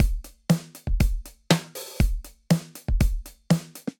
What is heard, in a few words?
32 Pad Kit, Drum Kits, Multisampled; Ableton; Drum Booth